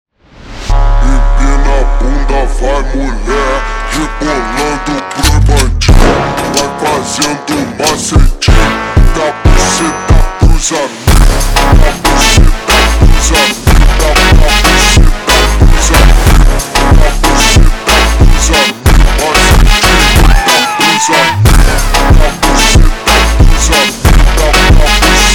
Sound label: Funk, super Slowed